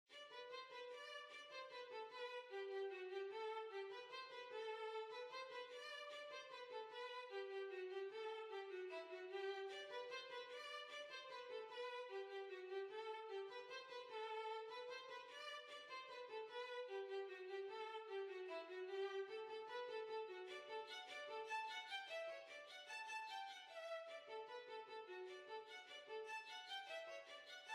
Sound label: Violin